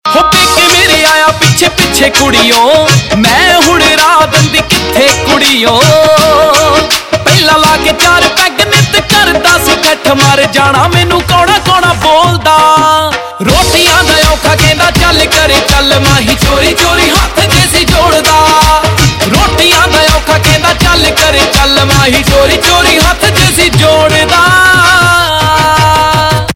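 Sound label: Ringtone, Punjabi Pop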